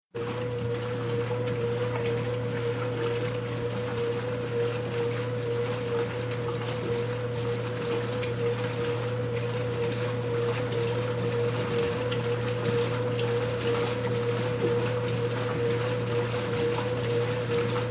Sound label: Wash Mach Wash Cycle, washing machine